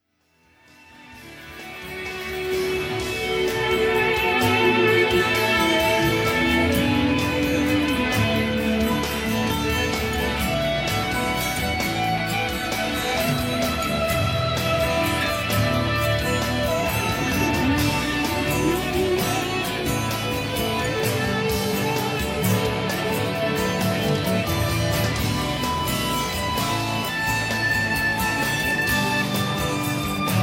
Acoustic Shine

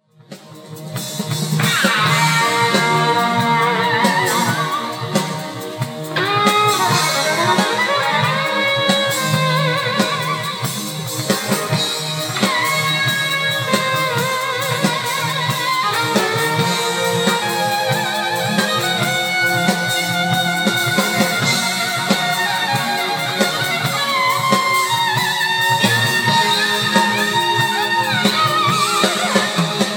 solo mink and neil with reverb

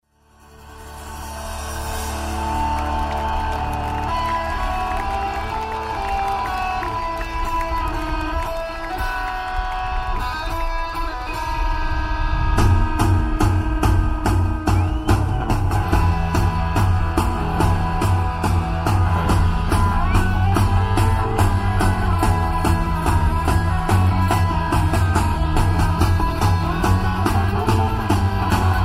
live instrumental

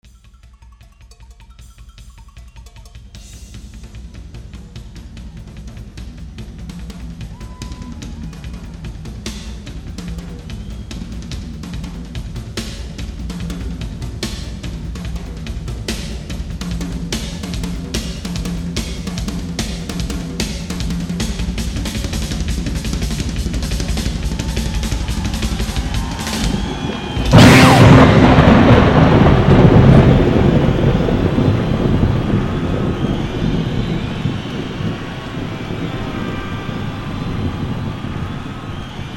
drumset gets hit by lightning

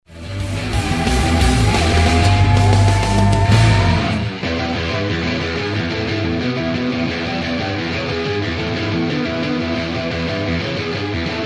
peaceable guitar ending w setup

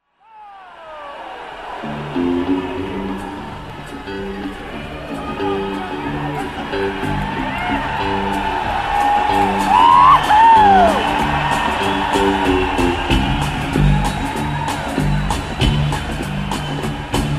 country improv

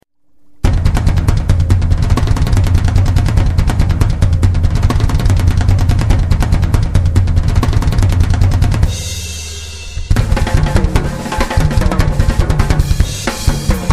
Drum Solo

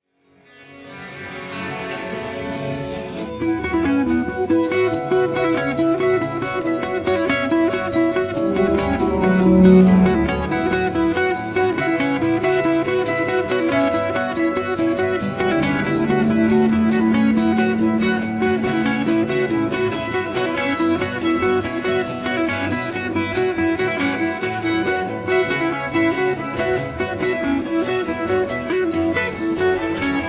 nocturne hidden guitar

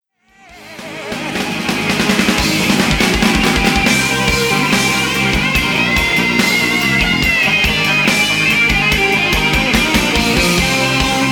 alex elevated solo

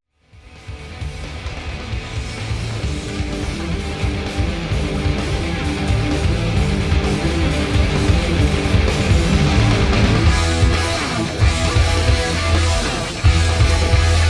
Secret Rhythm

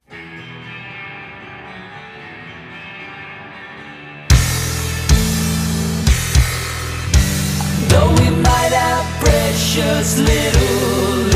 bass

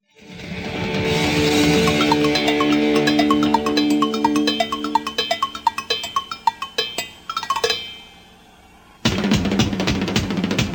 Cowbell

